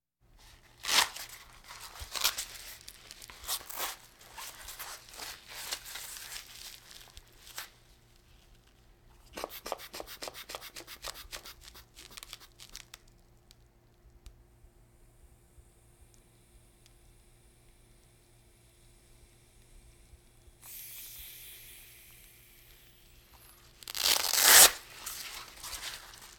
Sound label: Hospital effects